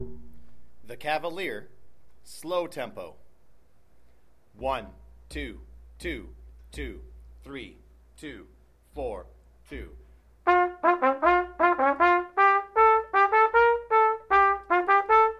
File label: Solo Only - Slow Version, Trumpet; Solo Accompaniments